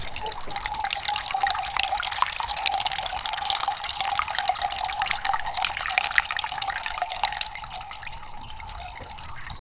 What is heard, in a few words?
a sound of a singing, fountain